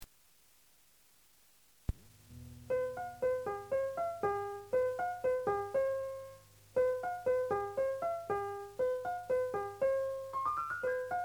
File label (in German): spieluhr; Etueden; Unterrichts-Phase